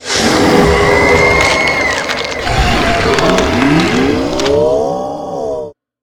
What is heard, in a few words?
soul suck; CosmicRage